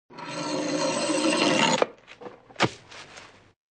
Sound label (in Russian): Zvuk giljotiny